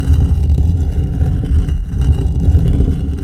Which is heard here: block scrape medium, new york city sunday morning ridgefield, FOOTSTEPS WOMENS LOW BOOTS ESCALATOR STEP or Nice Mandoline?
block scrape medium